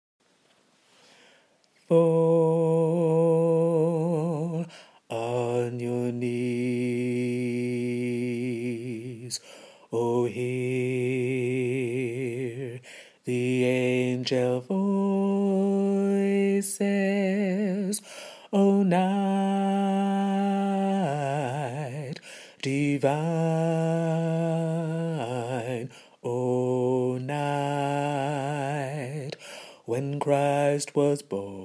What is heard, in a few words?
Bass, gospel choir; O Holy Night; Fall On Your Knees